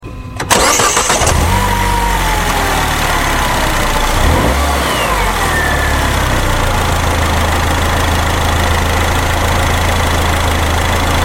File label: Cooper JW Ignition Outside